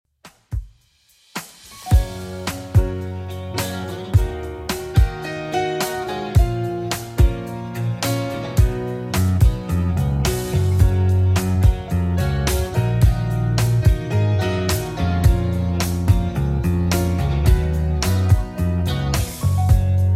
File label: 80s Redrum 108bpm